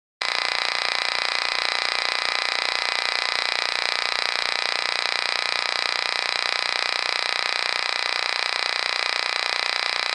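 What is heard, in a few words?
radio noise of a mini radio receiver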